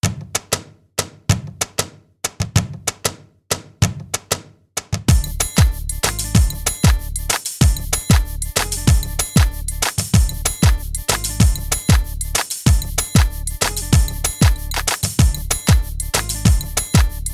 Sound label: urban drums